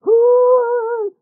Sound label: Executioner Attack Vocals